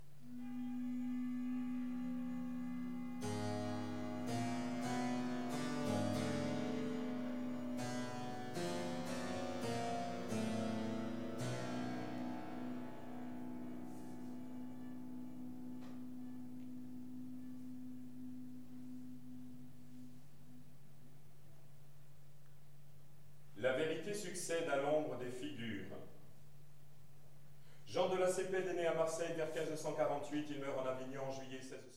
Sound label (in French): flute gambe clavecin baryton; Cantate des Penitentiaux